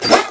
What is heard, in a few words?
blood bank spawn